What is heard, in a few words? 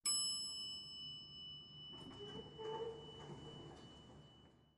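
Elevator Bell Door Open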